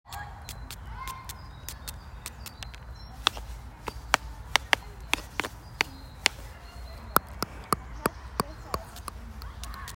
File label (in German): eichhorn frisst nuss; waldnasen geraeuschesafari